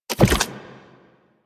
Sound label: Fan Energy Trench Activate Attach; Warframe; Protea